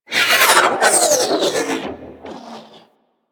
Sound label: Sentient Ship Roll; Warframe; Enemy Ships; Space Fighter Sentient Coil Agent